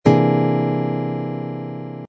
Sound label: c dominant ninth sharp five chord voicing, guitar